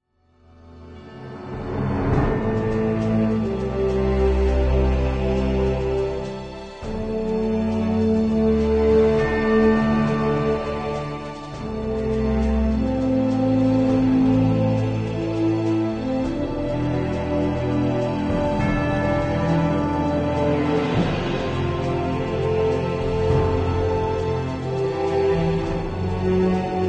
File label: Epic Western Underscore